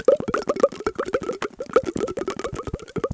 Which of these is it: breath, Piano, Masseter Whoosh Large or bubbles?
bubbles